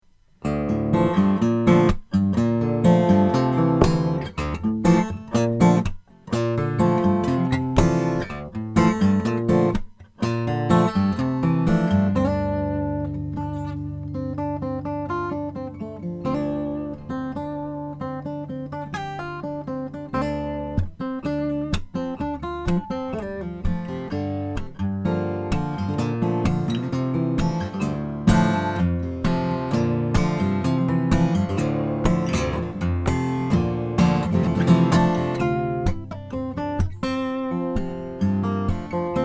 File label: Greenfield G4 2 DADGAD